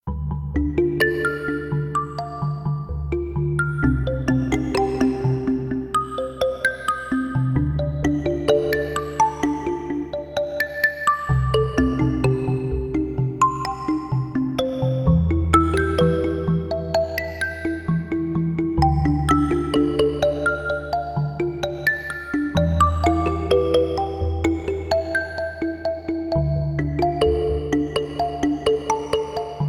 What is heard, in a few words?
Lost Jungle Looping; altariaonline